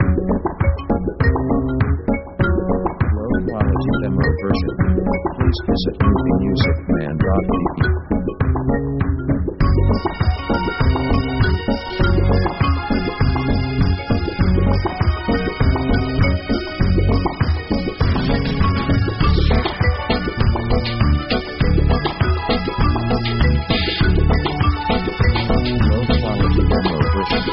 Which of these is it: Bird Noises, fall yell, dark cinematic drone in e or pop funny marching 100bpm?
pop funny marching 100bpm